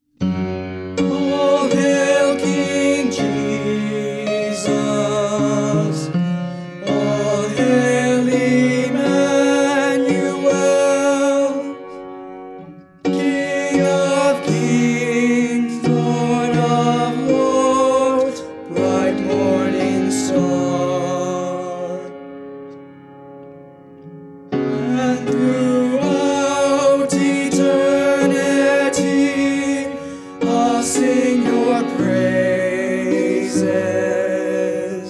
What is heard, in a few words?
(Tenor)